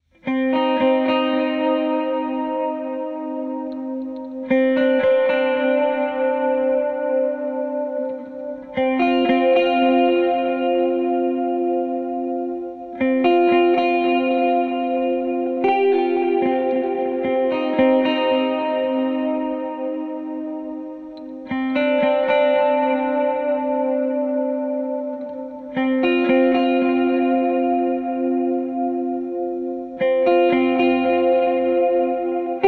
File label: Dream Git bypassed; xfilter